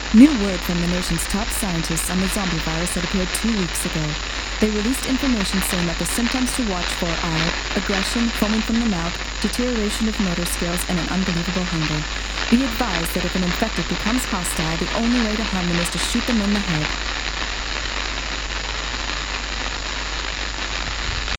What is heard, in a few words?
zombie